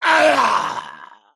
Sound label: Ghoul Attack